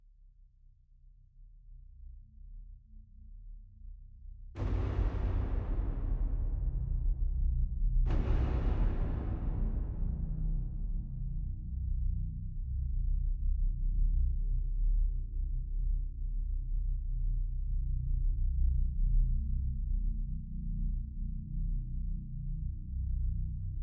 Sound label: String